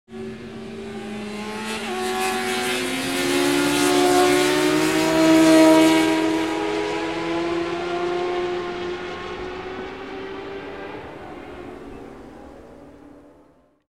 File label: Motorcycle Passing By